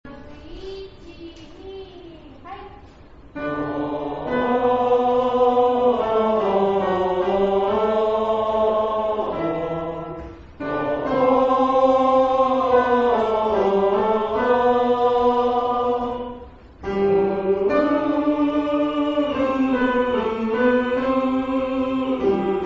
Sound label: high, mannerchor